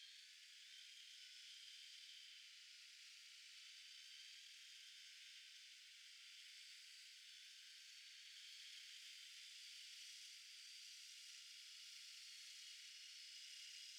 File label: DRONES